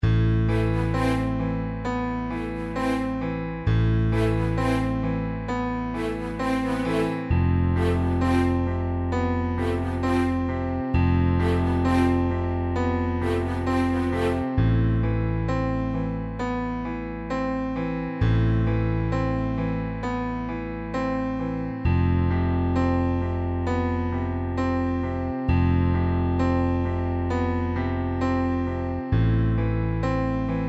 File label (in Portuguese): playbacks forro